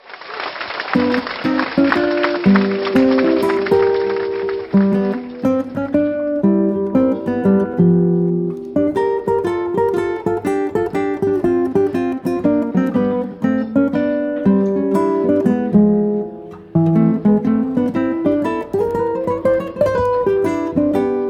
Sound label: Blues in G